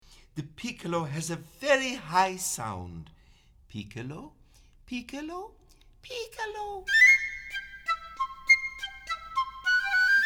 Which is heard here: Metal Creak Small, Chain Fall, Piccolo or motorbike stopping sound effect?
Piccolo